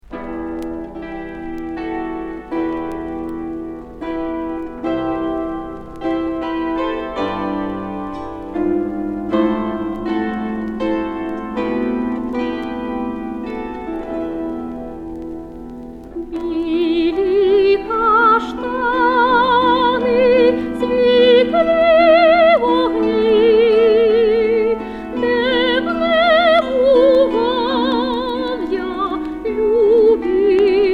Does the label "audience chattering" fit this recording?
No